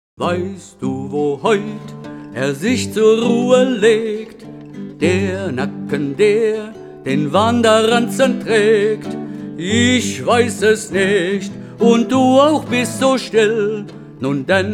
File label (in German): solo; eines wandernden lied